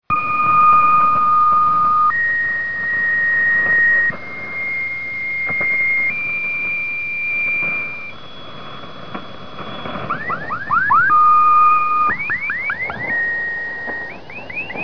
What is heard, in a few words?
haarp, digital modes